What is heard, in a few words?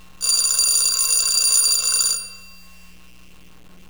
bell; Federal Signal